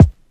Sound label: Kick